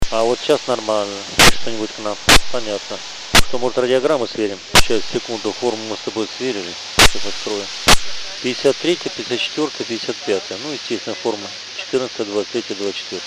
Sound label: ISS 1436MHz; kosmonaut